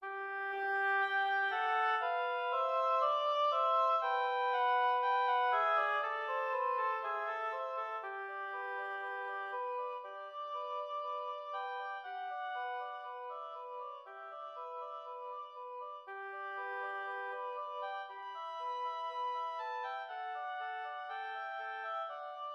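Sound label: study 2 oboes; J.J. Friedrich Dotzauer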